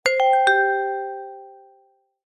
multimedia alert chime short musical notification cute child like